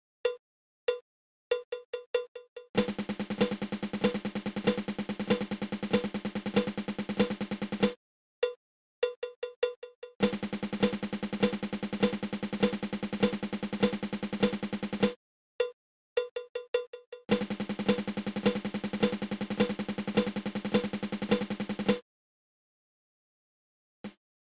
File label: Flam Paradiddle diddle